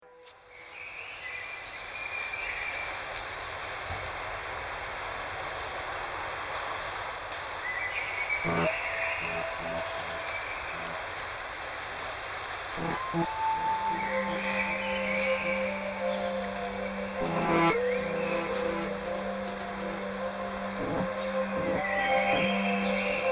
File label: Night Birds